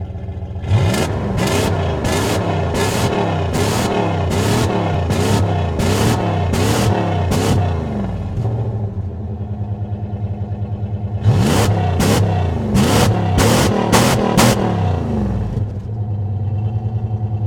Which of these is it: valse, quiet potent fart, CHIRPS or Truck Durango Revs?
Truck Durango Revs